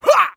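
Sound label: voice punch grand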